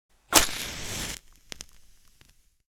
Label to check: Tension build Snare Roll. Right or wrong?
Wrong